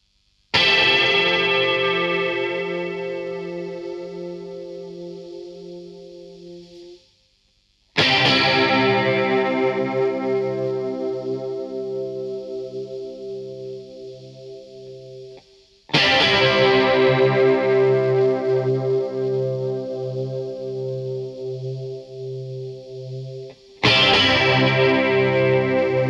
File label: Guit; Multitrack